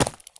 sprint slate; Escape from Tarkov

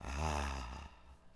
Science Ahh Vox; Civ4 warlords

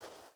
footstep burlap sand walk; Rust

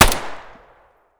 gyrza distant; Escape from Tarkov

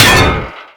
hydrant body impact; Explosions

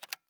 beacon switch; Escape from Tarkov

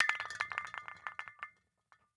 beancan grenade bounce; Rust

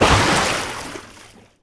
Oars Water; Civ4 warlords

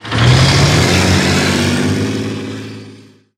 CH2 Engine Acc Start; DCS

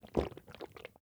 drink; Rust

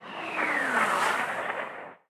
combat atmosphere; World of Tanks

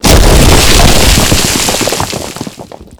collapsing burst; gulag; Call of Duty Modern Warfare

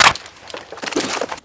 FN2000 reload clip out, foley; Call of Duty Modern Warfare

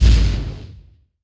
damage hits explosions

